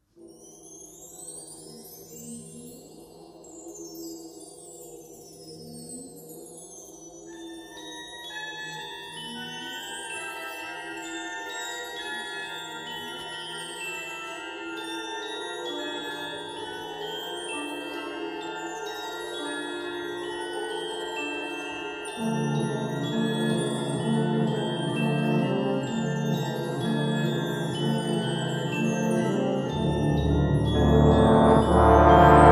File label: Creepy Trombones